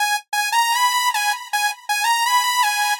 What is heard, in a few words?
Saw Synth Ringtone